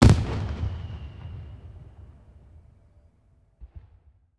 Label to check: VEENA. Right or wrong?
Wrong